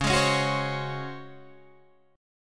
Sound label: Db Major 7th Suspended 4th Db down Guitar Open G